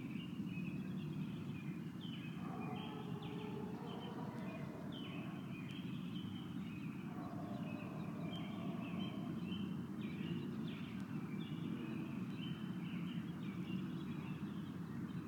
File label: Evening sounds